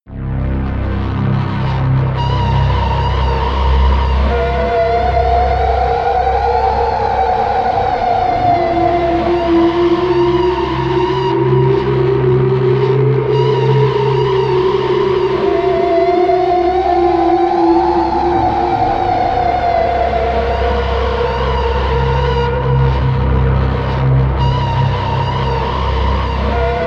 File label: drone squalor